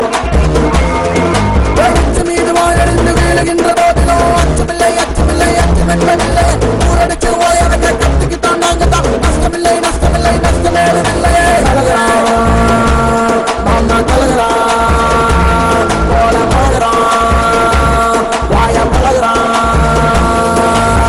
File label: Tamil Kuthu